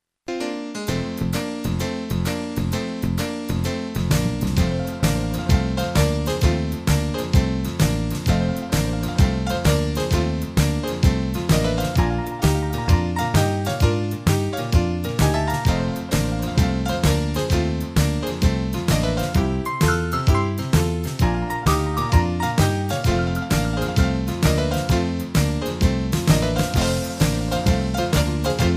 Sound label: Theme No Vocals